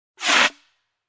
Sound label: Short Fire Extinguisher Spray